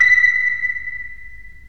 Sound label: ELEC GRAND; OMI - Universe of Sounds